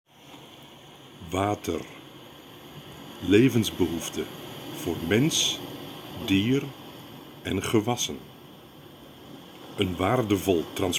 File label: Water